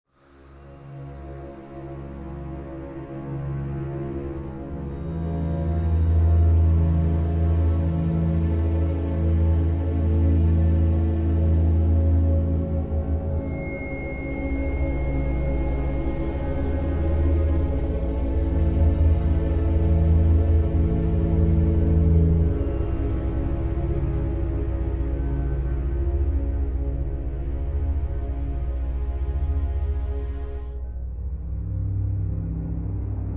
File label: Emotional; the walking dead michonne 2 give no shelter